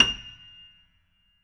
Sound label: PIANO FF F7